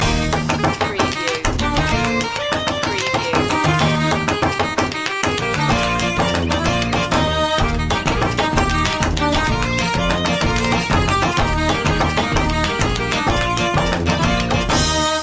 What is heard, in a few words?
dance ye merry loop